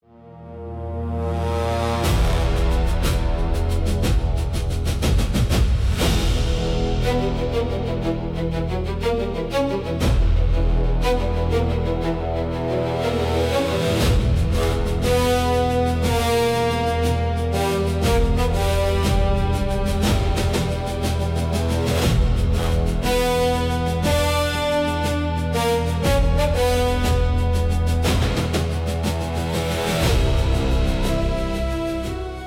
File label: drums; blackgate; battle